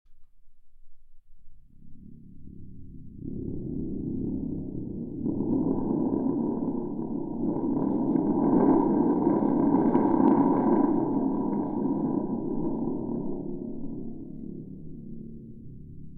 Donnermacher

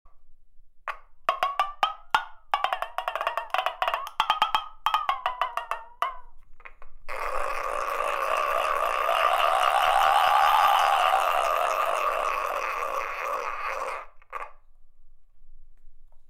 Rummelpott groß

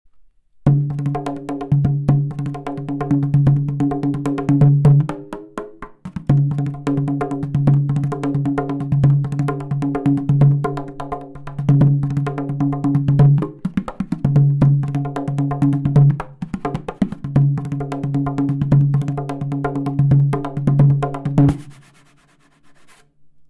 Trommel

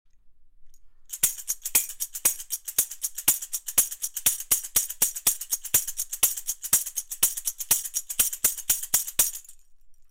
Rhythmusbrett

